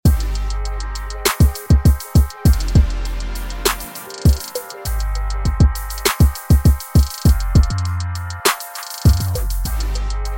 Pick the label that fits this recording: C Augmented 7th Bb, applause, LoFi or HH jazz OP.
LoFi